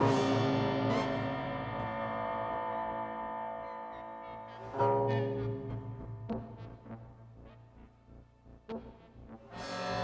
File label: Music Gameplay State Tension; Music Hideout1; H1 Level Area=Narrow Streets